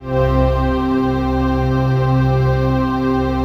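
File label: chord pad, Pads; Best Service; XX Large - Pads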